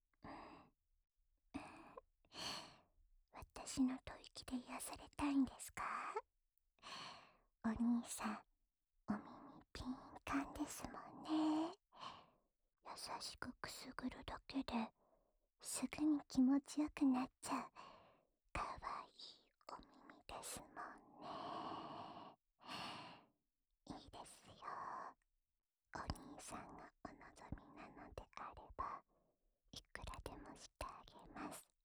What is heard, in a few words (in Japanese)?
お耳ふーふー（右耳）, ASMR